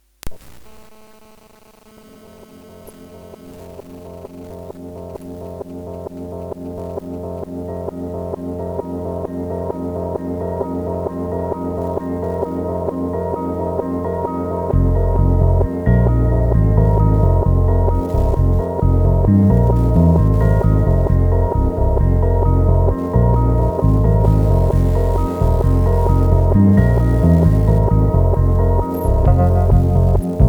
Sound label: galaxysii cpu edge noise; galaxysii review; anandtech